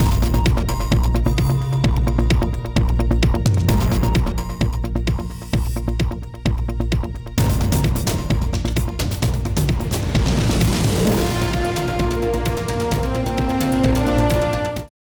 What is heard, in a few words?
Music